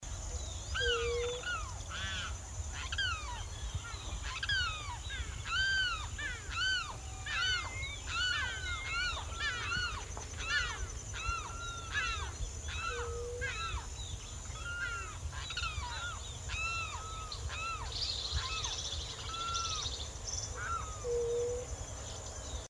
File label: Aramus guarauna - Limpkin